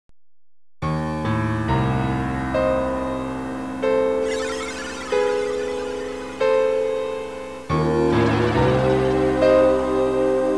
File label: dungeon; Seeker